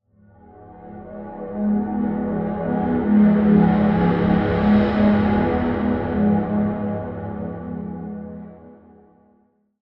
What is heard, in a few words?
Pad; Routed Sound; Spire Expansion - Doom Carpenter Vol.1